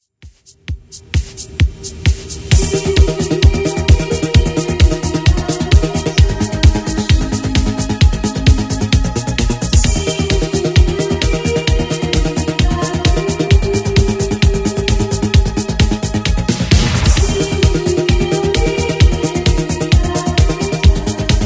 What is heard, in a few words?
80s Redrum 131bpm